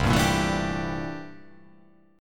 C 13th C down; C13 strummed

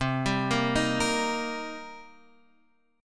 D sharp 6th, Guitar

